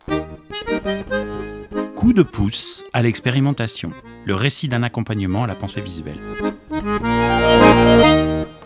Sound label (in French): jingle coup de pouce; podcast